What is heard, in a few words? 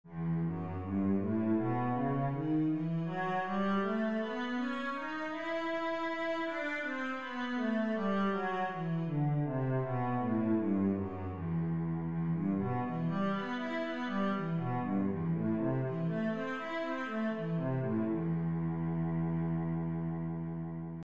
cello, e minor